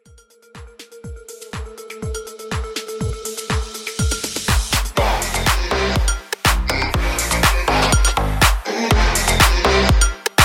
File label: Pop Club Mix 122 BPM